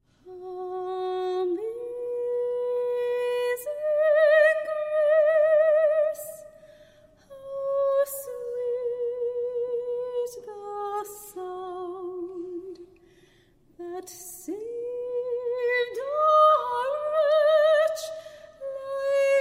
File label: Live Recording, Classical; Eccles Hall; La Belle Voix New York